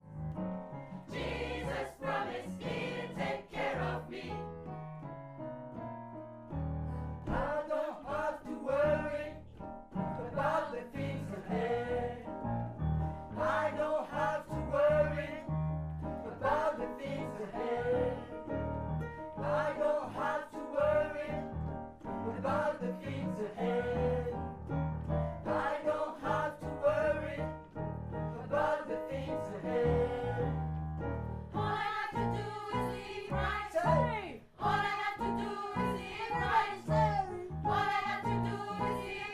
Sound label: soprano